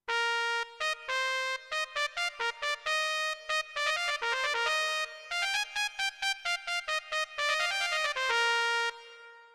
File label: Trumpet